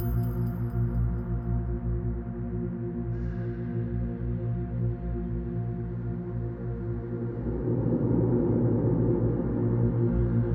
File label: level loop; vikings